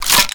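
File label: weapon foley m1887 reload shell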